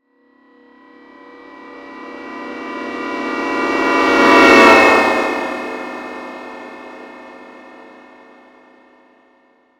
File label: Spaceship Pass By, Small, Ship, Science Fiction; 5.1